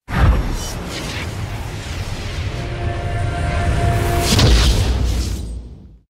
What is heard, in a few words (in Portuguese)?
som de magica acaba